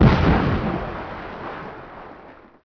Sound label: mine Explode; Feeding Frenzy